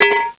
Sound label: bounce, weapons; ParoxysmII